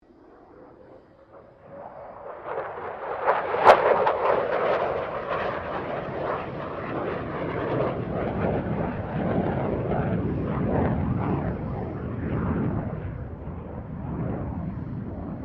AIRCRAFT F16 FALCON MANEAUVERING; Airplanes (Sound Effects:Vehicles)

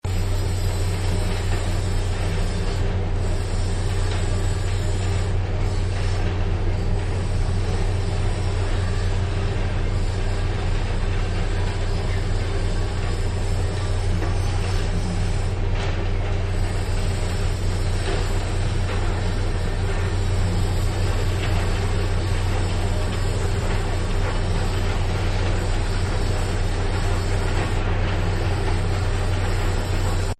Quarry Workshop, Construction; Backgrounds

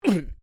MALE VOCALIZATION UGH; Exclamations; Voice

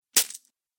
Coins Impact; Money Sound Effects

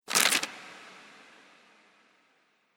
Monastry Manuscript Paper; Paper Sound Effects

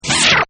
Squiggly Monster; Monsters; Horror; Partners In Rhyme

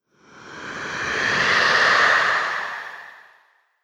Dragon Abstract Cry, monster; horror

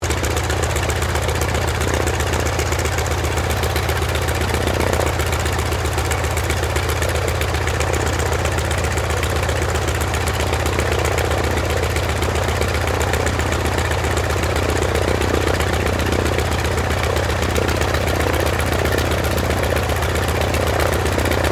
AIRCRAFT CAC WIRRAWAY IDLE; CA-16 Wirraway; Airplanes; Vehicles

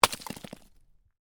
Ice: small chunk of ice lands on hard surface; Snow; Stuart Duffield

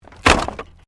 Wood Movement Heavy; Material components for constructing more complex sounds; Materials

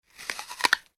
human eat crunch apple; Human Bite/Crunch Apple; Eating

